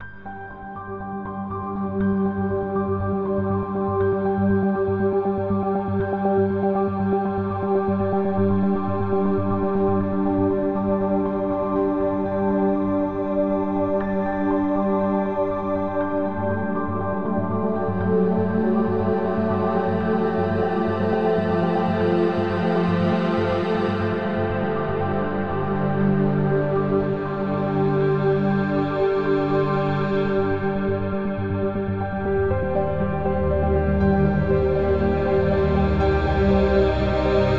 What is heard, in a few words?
Ambient Exploration; Music; Added Ambient music pack; Exploration (RT 6.0)